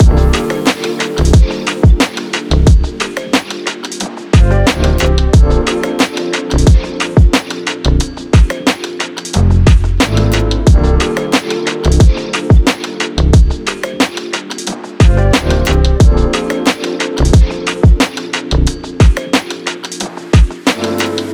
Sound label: chill travel